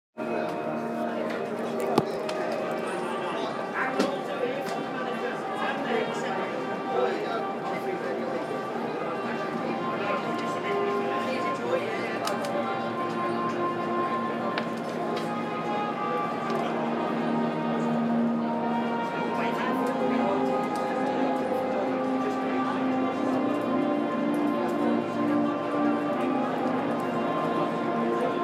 marching band opens action; at facupfinal

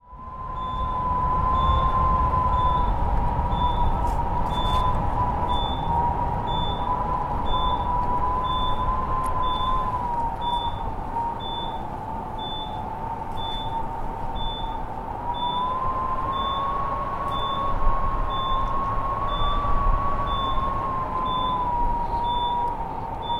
howling wind at Swansea observatory

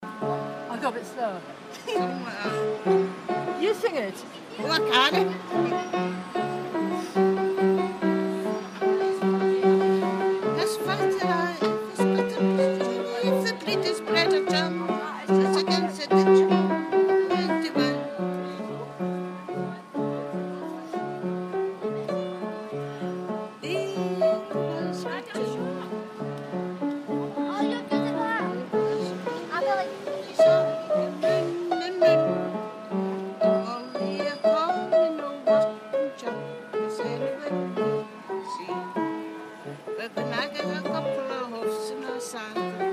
a good old piano singalong

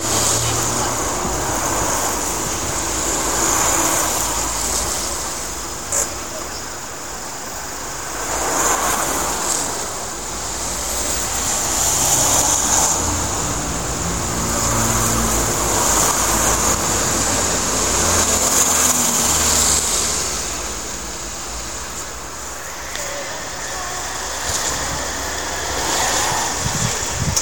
uk sound map from swansea

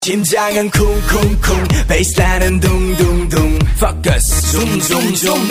ringtone

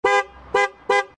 beacon horn

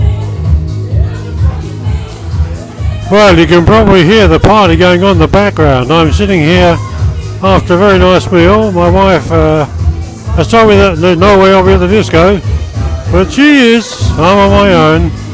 disco

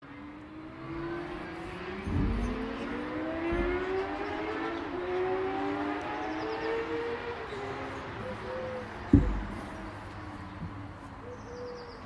collared doves call and fly

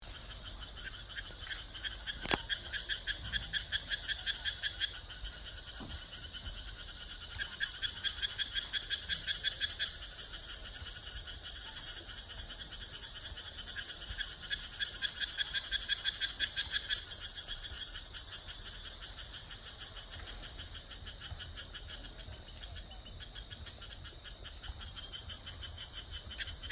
concert of frogs and crickets, Sardinia; chia

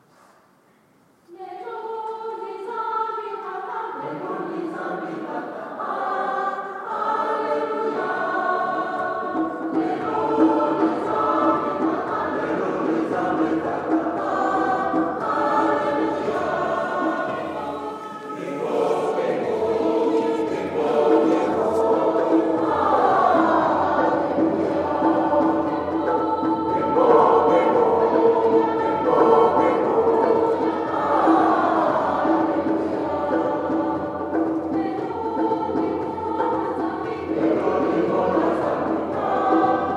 Windhoek Catholic church choir

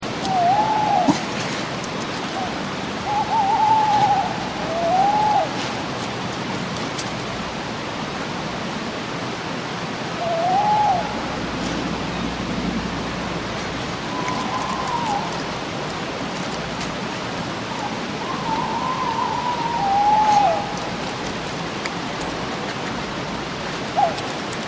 tawny owl fest

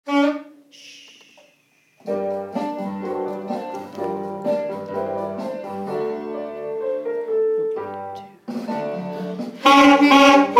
saxophone stripy cat

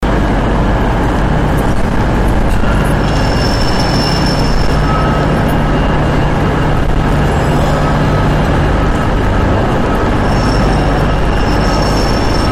central train station glasgow

